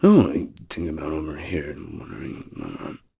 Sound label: Vox Hum; kingsraid; voices heroes; en